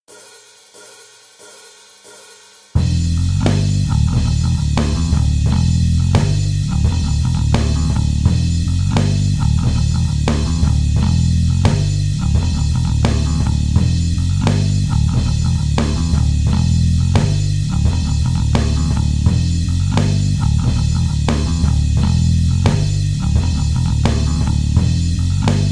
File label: Solo 1 Cuerda Backing